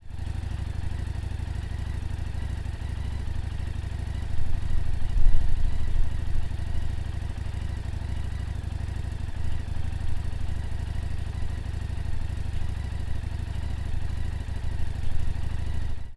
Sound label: Street 500 STOCK Idle; Exhaust Sound